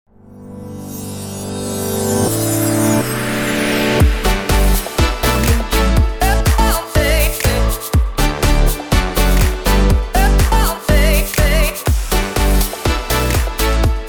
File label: new vibes logo